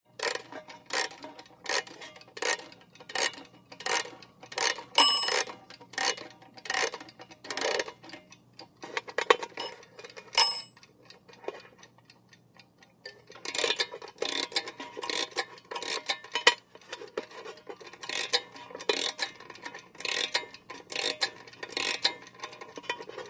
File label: Alarm Clock Wind Bell